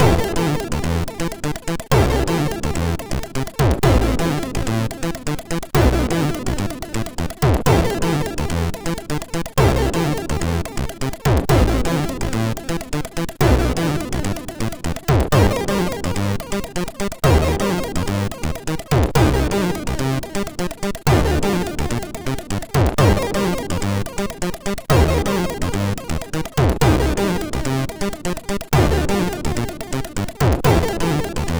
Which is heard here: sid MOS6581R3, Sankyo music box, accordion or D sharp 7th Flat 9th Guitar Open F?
sid MOS6581R3